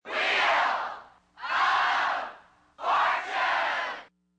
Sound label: chant